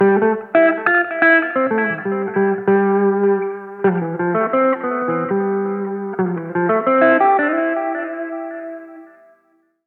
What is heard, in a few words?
Classical Guitar Riffs Nylon String Guitar